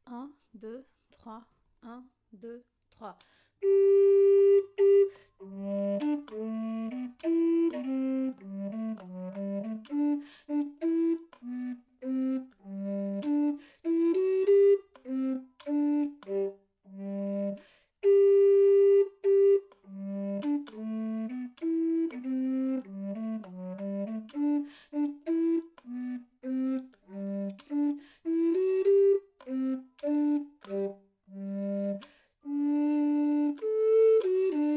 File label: Brade gaill bass